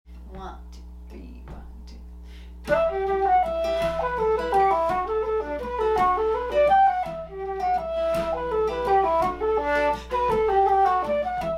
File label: Flute and guitar; Waltzing o Wash Day